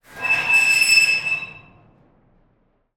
Dumpster door, from inside, door, slide, rusted, screech, grinding, resonant; Eiravaein Works; Vaeyan IV